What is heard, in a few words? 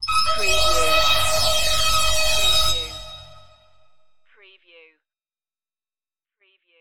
laser strobe pulse